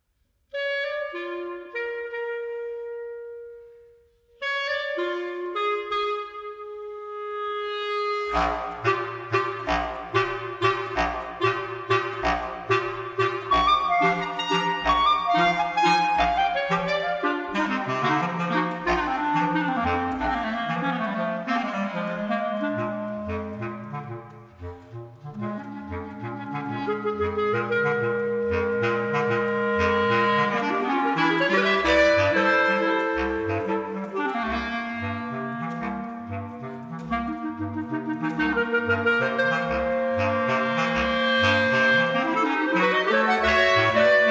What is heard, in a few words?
Three Preludes I. Allegro ben ritmato e deciso, Clarinet Quartet; Arr. for Clarinet Quartet by Erin Miesner